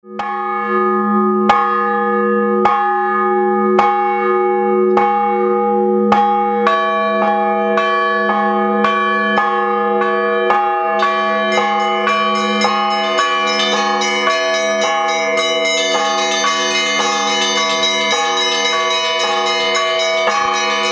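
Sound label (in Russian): pashalnyi zvon kolokolov, Easter bell ringing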